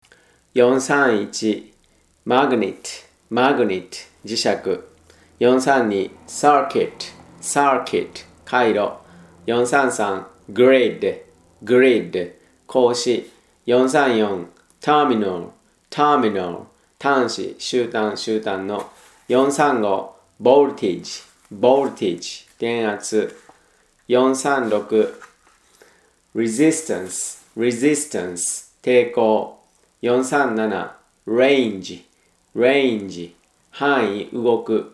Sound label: tango